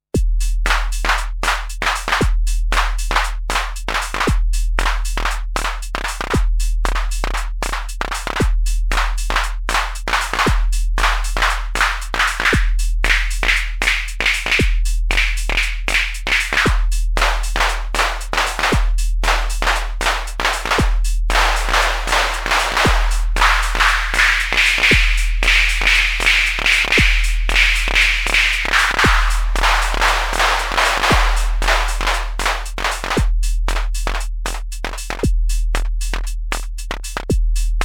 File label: Akai Tom Cat Clap